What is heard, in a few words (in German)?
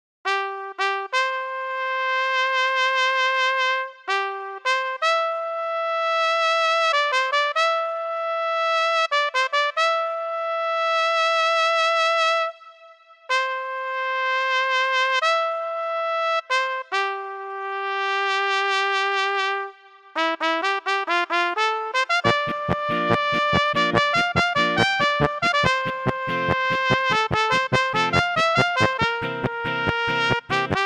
Trompete